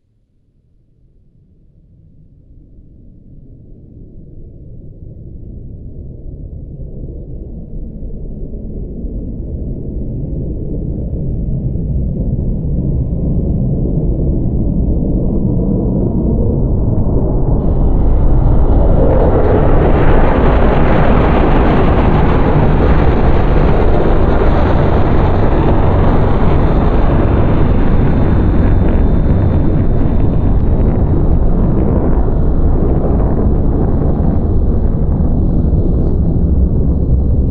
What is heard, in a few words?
destroyer, vehicles strogg flyer; Quake 4 Alpha